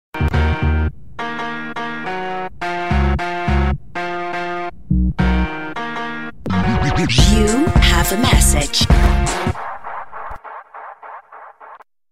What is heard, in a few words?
hip hop sms